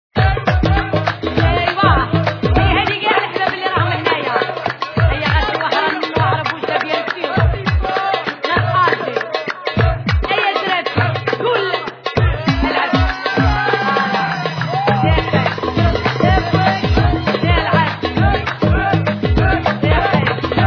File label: Rai Ambiance